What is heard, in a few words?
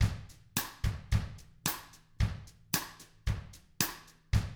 111 COUNTRY; ILIO; Double Platinum Drums 1